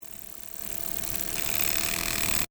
Stutter Static, Stutter, To, Hit, Metallic, Dark, Deep, Bass, Lfe; Production, Element, Imaging, Accent, Transition; BlastWave